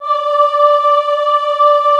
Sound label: AHH D4, Classical Choir; Best Service; Extended Classical Choir